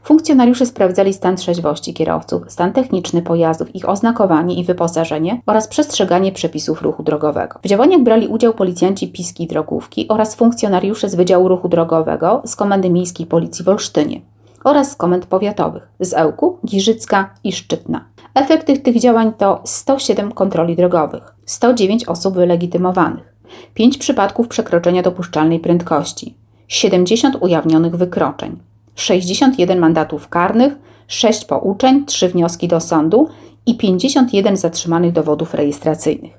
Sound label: funk